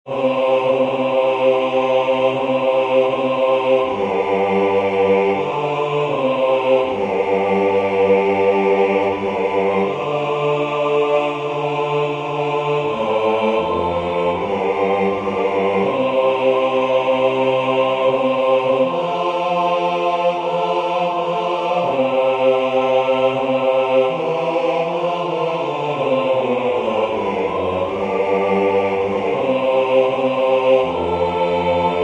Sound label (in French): basse; Benedictus qui venit